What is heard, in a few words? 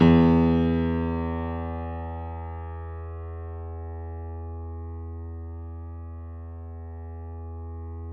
piano; East West; The Ultimate Piano Collection